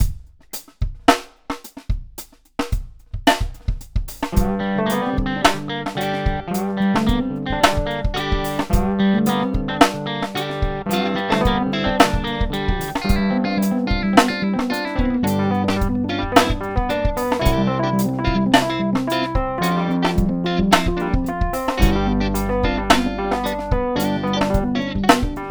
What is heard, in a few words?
Country Funk